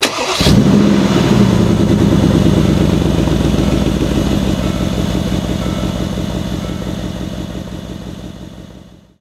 startup, vehicles

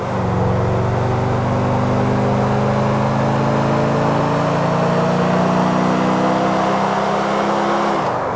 third, vehicles; premier

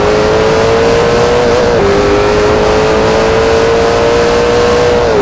third, vehicles; lmp

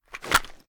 reload end, weapons; gmod; viper

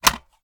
reload empty fast mag mag out; gmod

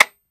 weapon disconnector; gmod; viper

